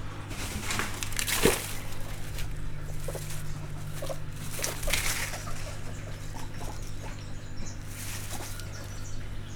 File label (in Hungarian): frissen mozognak, tengerimalac; szegedi zoo 2011